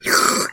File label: slurp